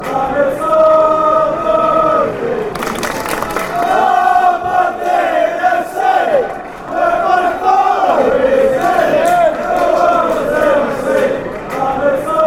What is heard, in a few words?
aberdeen fc fanchants